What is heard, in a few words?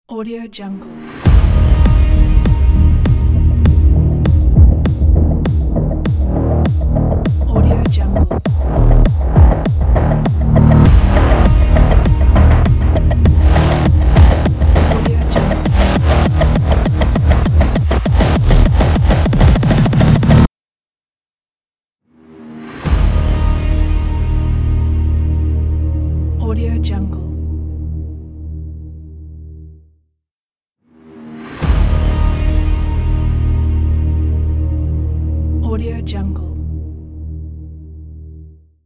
epic trailer intro ident